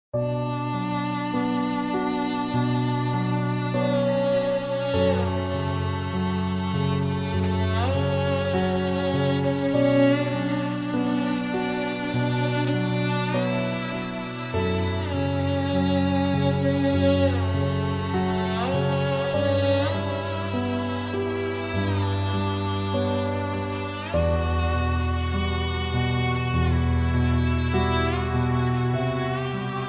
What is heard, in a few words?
Sad Piano with Moving on Violin; Relaxing Music